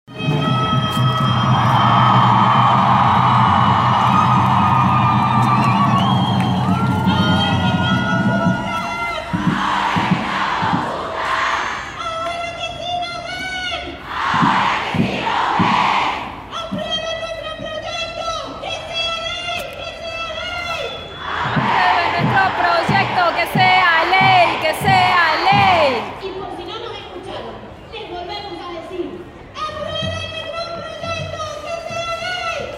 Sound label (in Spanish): CANTO ABORTERO